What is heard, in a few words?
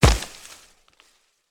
car wood impact; factorio; Factorio 0.14.21